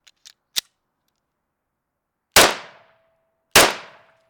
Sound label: 9mm Glock Gen Five Shooting